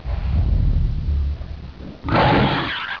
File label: chomp